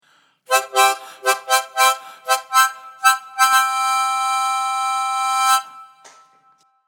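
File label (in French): impro solo harmonica; loto sonore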